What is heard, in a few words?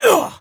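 voice punch grand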